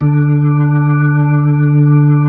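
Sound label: B-3, Hammond B-3 organ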